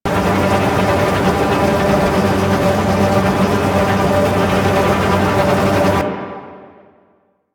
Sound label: Fighter Launchpad Ext Hover Engine Loop; Ambience; Props; Warframe; Corpus Railjack